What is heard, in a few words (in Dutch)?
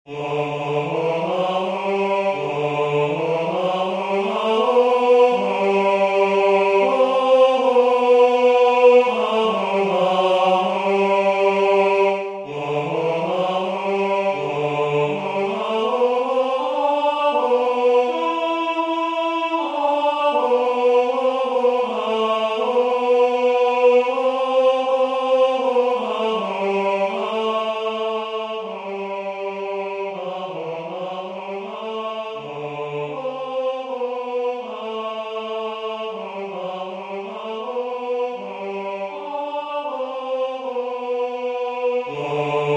wees gegroet maria tenor